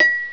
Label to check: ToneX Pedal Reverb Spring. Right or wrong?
Wrong